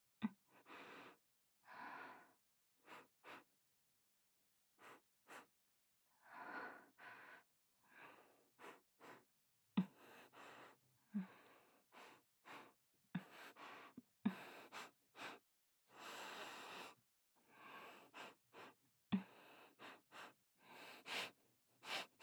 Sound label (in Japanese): チン嗅ぎすんすん, 低音ボイス; 低音ボイス奴隷執事。ZWEI「…カノンはあの日から…坊ちゃまだけの…女なのです…。」